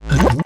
Pot Lift

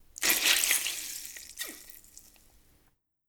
Sponge Squeeze

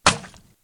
Bug death; Frogsong

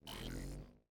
Battery Plate Light On

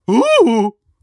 Maggot Startle

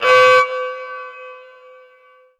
Siren

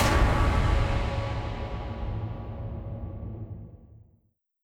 Trap Tablet Hit

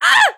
Grunt Female

